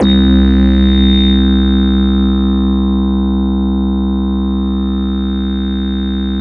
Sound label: JV-1080 RESOTRAIL; VINTAGE-EXP